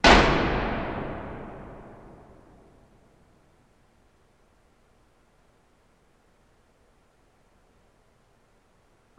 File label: Buick Door Slam